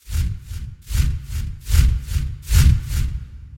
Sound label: big swoosh; tongue-fight